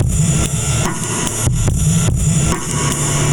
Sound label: Overnourished, Drum Loops, Compressed; Zero-G Smoke